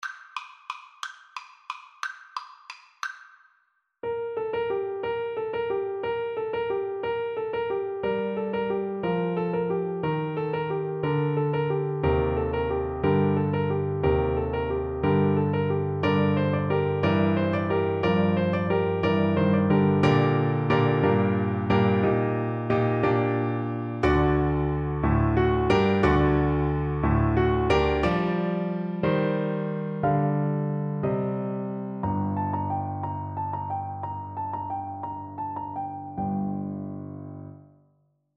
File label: carol of the bells, Tuba